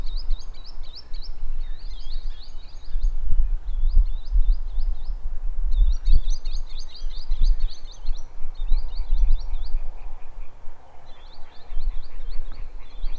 Piro Piro Culbianco

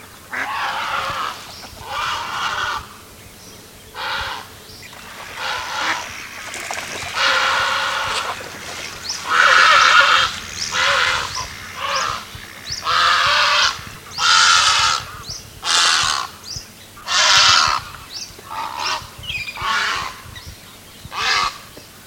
Airone Rosso

